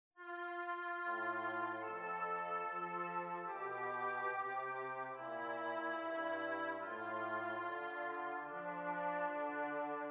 esercizi di contrappunto semplice A due voci; 4 gattung; Ronge

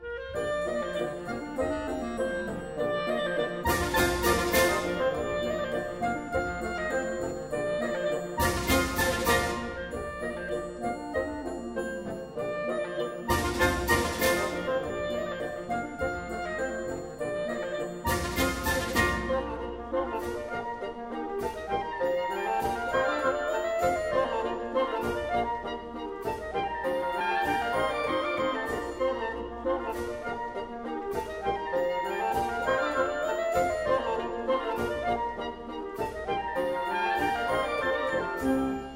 Marcia in fa maggiore per banda militare; Marsch fur die bohmische Landwehr; il trio

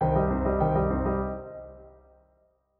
Battuta per pianoforte

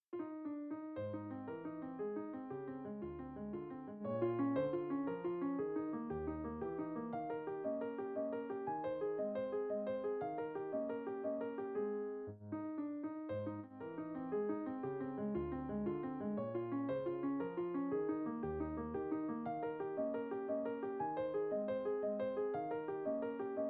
Valzer per pianoforte in fa minore; Schmerzenwalzer

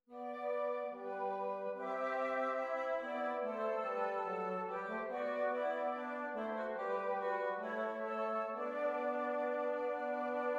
esercizi di doppio contrappunto nella decima

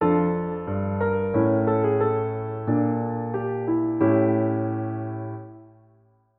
Appunto probabilmente per pianoforte

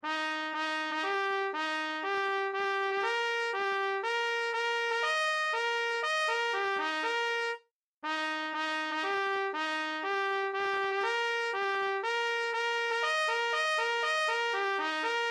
Marcia in mi bemolle maggiore; Abbozzo

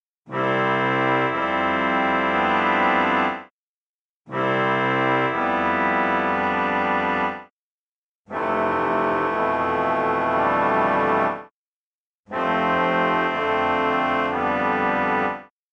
Ritardi dell accordo di seconda tritono

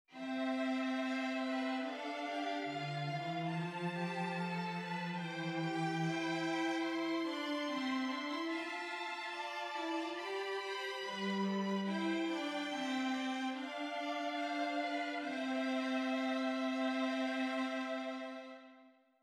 esercizi di doppio contrappunto nella decima a Tre; Freye stimme; Ronge pagina 187

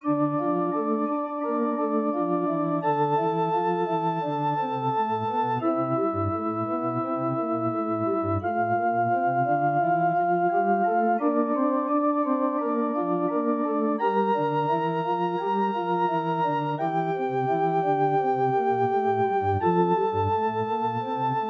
Esercizi di contrappunto a due voci; zur Dritte Gattung; Ronge pagina 66

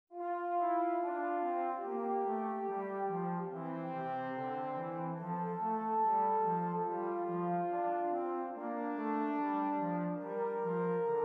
Esercizi di contrappunto a due voci; dritte Gattung; Ronge pagina 59

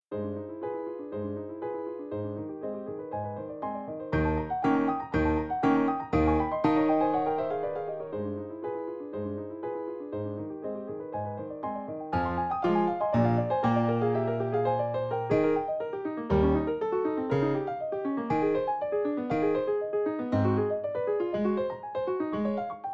Impromptu per pianoforte Allegro; Benedict Julius

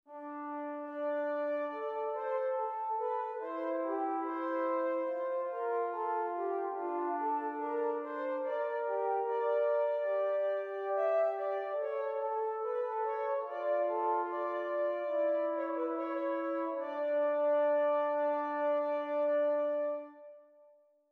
Esercizi di contrappunto a due voci; dritte Gattung

